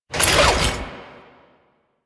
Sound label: Body Roll